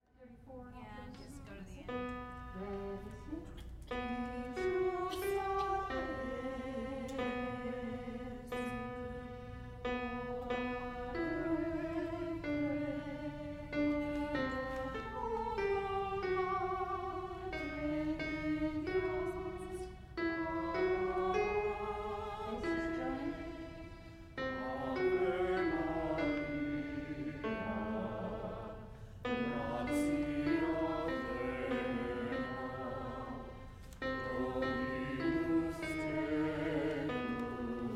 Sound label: alto and bass